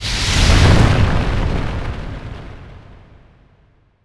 fire; RYL 2 v1673

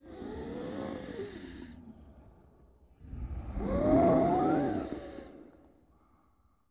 Deathly Breathing, Vocals - Monster; Sony Pictures Sound Effects Series

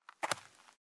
Footsteps; Age of Empires III; cinematics

